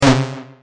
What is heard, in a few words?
Obstacle